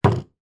household bottle of mouthwash place down on hard surface